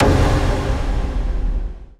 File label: Shot Device; FREE SFX PACK VOL 2